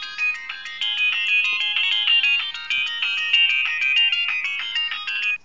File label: nokia3510i backgatone ringtone